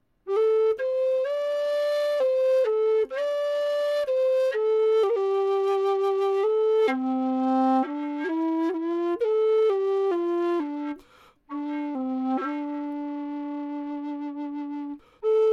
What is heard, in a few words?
Whistle Low C Song